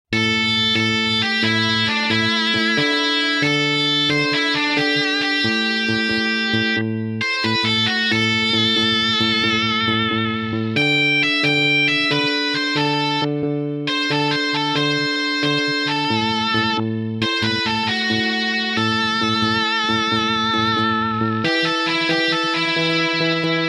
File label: Call Response A; solo techniken